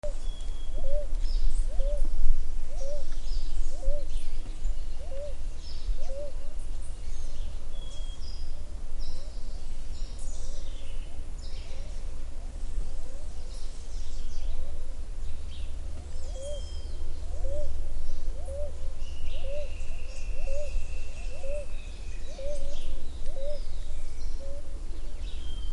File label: ecuadorian ground dove